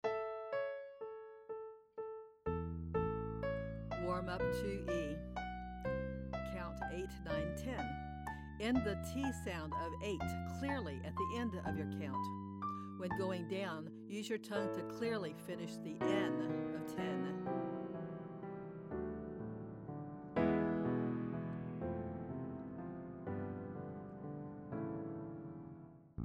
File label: Vocal Warm Up Count 89 Ten